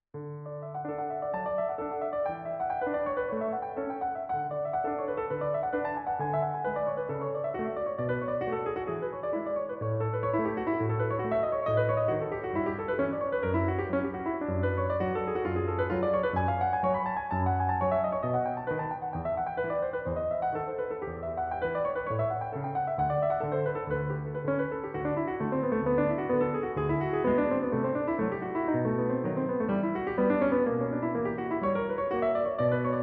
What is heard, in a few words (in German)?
Praeludium D Dur